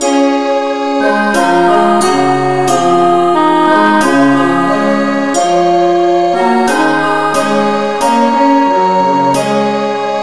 no vocal, key des; christe Sanctorum

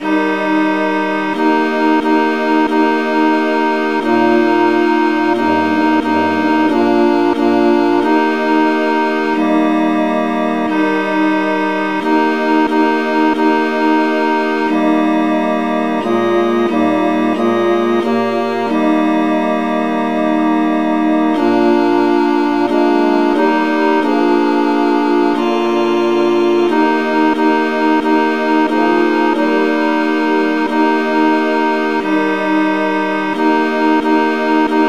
satb hymn

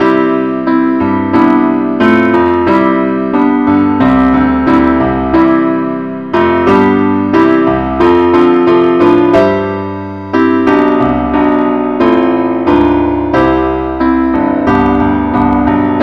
keyboard only, key c; christe Sanctorum

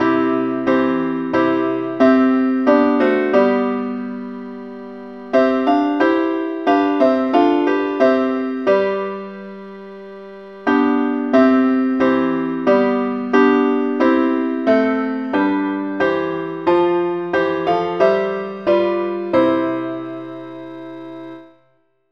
parts only, key C

